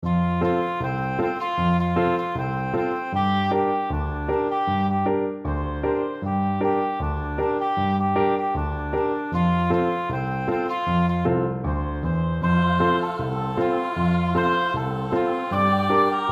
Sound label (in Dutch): Fruit canon zonder zang